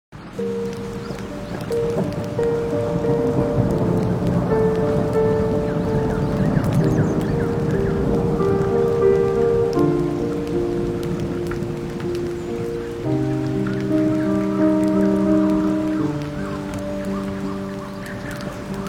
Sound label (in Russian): Zvuki Dlia Sna, Shum Dozhdia, Zvuki Prirody, Shum Moria, Zvuk Vody; Zvuk Kostra